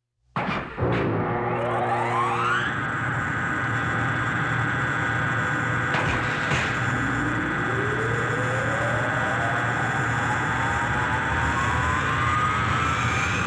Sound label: Motor Start And Run, sound fx